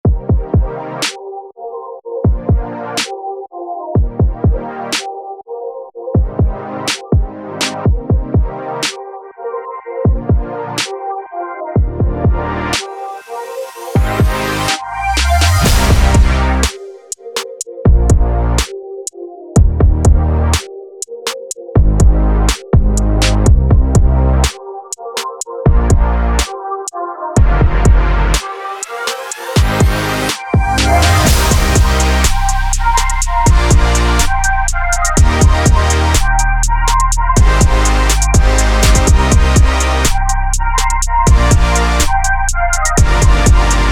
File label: Pulses